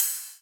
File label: Rides, Cymbals